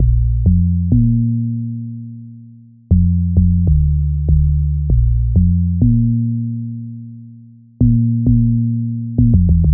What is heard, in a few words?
Dancehall Afrobeats